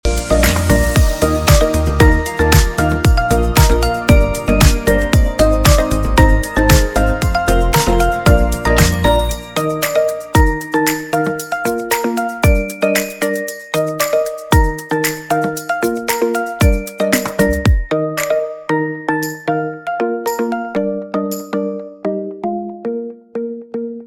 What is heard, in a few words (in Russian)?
Джингл